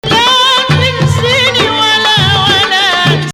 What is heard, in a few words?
Rast Rast Octave